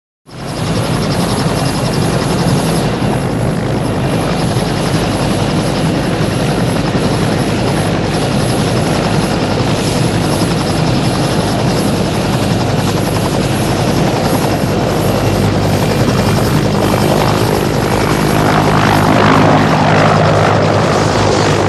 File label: Helicopter Interior, whirlwind